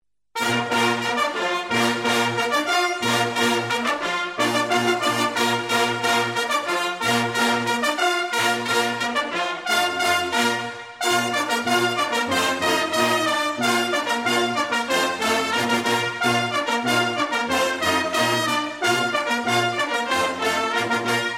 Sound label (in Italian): fanfara dei bersaglieri; la corsa